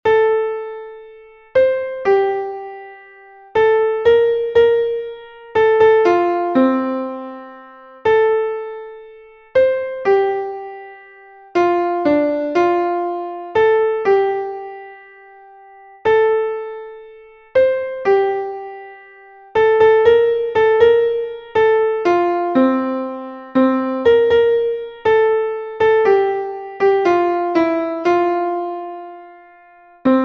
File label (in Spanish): soprano, himnos en piano; cantos del camino